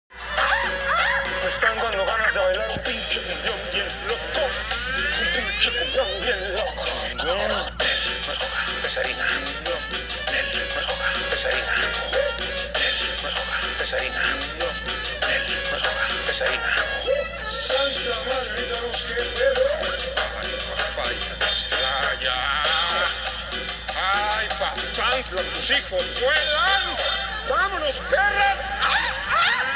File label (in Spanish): cumbion